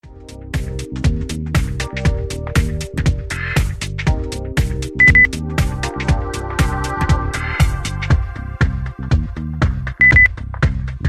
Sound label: lounge